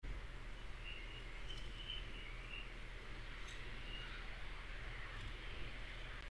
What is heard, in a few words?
mystery call